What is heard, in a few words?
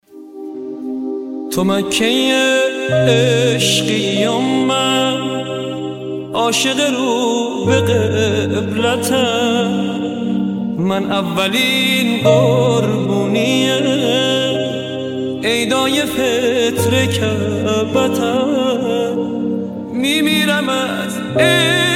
piano Version